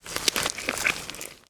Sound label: Liquid